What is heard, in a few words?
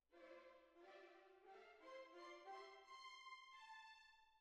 Cbasses